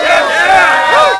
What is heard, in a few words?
solo; WhiteOut; XBOX; bokujo